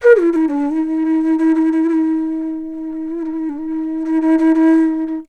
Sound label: FLUTE D, Indian Traditions; USB Soundscan vol.45; AKAI